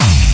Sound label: obnoxious kick